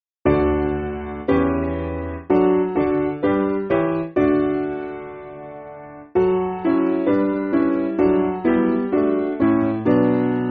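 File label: Piano, Backing Files for Churches; Christ Whose Glory; Ministres De Leternel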